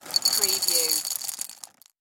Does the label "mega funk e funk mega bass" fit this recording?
No